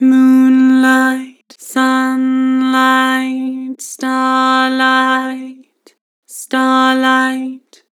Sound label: Vocals, Flat, Moon sun starlight; Harmor